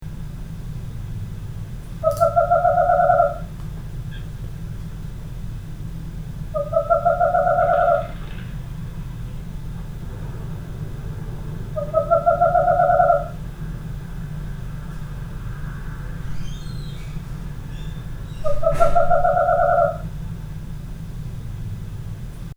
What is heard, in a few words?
Western Screech Owl